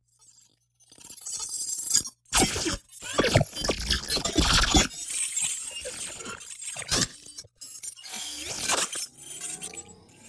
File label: Storms Swarm; Gothic Storm Music; Advanced Sound Design 2012